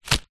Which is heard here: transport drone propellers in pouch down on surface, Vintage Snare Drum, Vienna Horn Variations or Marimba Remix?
transport drone propellers in pouch down on surface